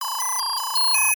reminder; Cosmic Rage; general comms

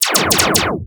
lasers

